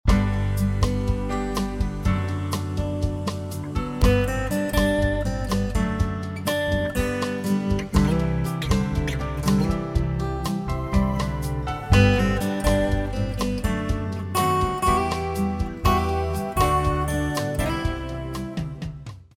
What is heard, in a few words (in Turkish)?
santral fon muzikleri